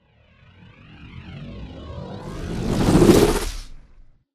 cloak deactivate; Titanfall 2

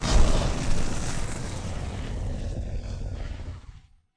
boost generic end; Titanfall 2

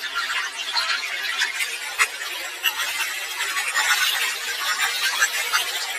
digital floor; Titanfall 2

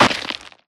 Mvmt FS Jog Dirt Layer; AI Stalker; Titanfall 2

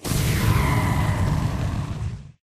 boost jet body; Titanfall 2

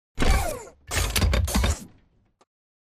alert idle to walk; Titanfall 2

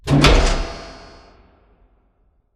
Assembly Conveyor Start Close; Boomtown; Titanfall 2

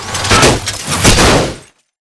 tackle mid; bt; viper; Titanfall 2

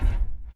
footstep jog; Titanfall 2

